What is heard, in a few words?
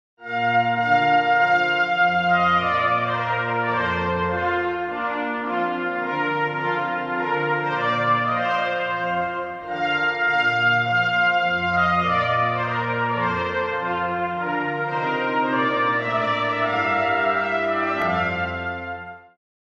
trumpet voluntary